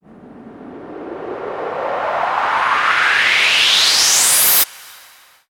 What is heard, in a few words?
FX Uplifter